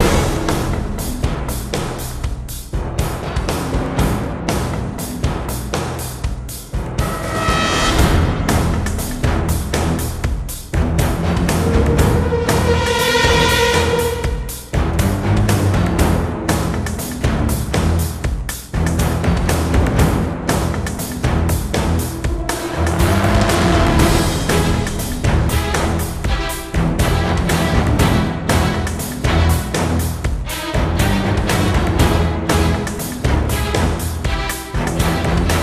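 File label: music, percussion loop strings; transformers war for cybertron gamerip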